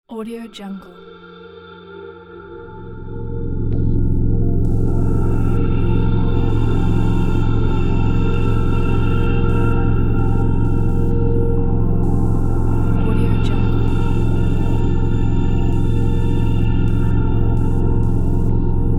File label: deep mysterious space